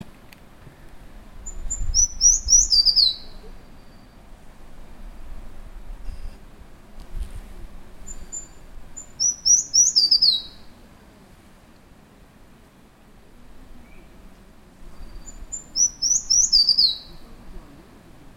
warbler sulphur breasted Phylloscopus ricketti

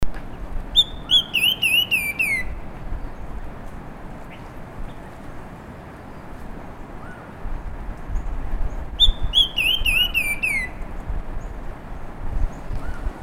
sibia rufous backed Heterophasia annectans

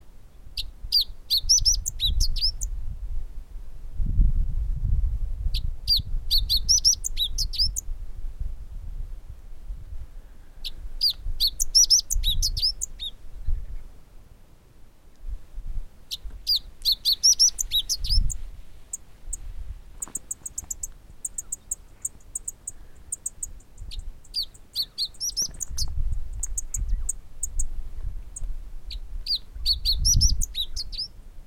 bunting tibetan Emberiza koslowi

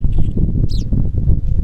pipit richard Anthus richardi

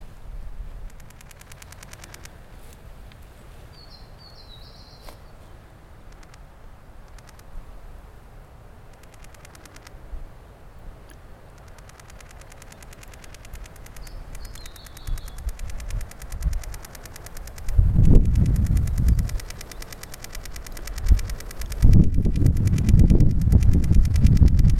warbler brown bush Locustella luteoventris

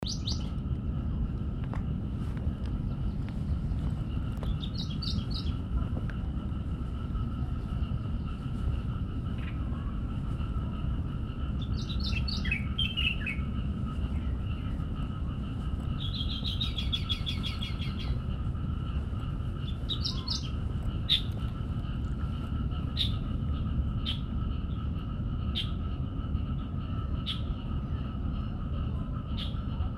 warbler grey crowned Seicercus tephrocephalus; Craig Brelsford

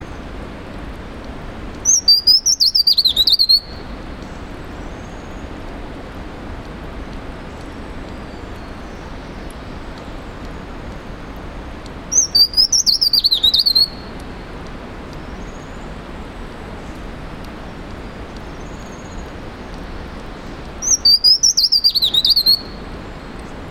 wren babbler scaly breasted Pnoepyga albiventer

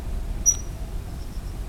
warbler pale legged leaf Phylloscopus tenellipes

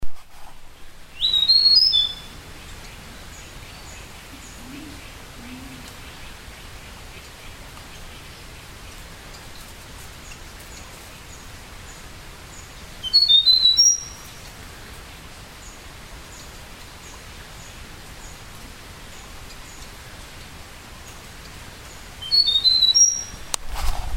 warbler yellow-bellied Abroscopus superciliaris

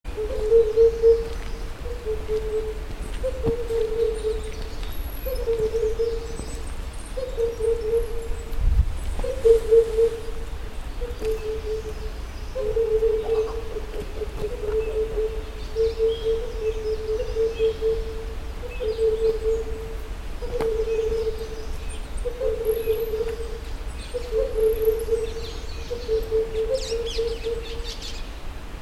cuckoo himalayan Cuculus saturatus

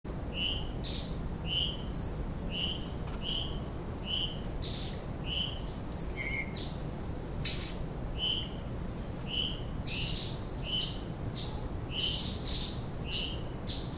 flycatcher white tailed Cyornis concretus cyaneus

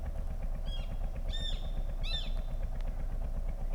shikra Accipiter badius cenchroides

